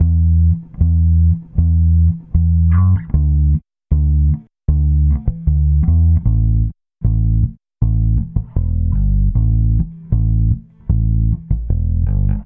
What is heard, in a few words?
Bass, Indie Rock; Sonic Foundry (Sony Creative Software); Indie Rock Anthems; Session 12 - Spaced Out Knoll